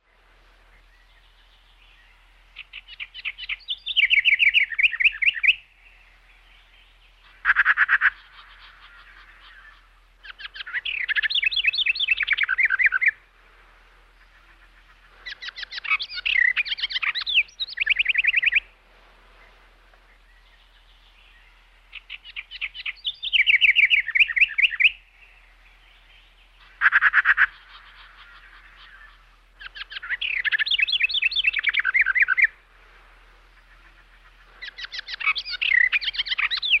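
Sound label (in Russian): красивое пение в лесу с сорокой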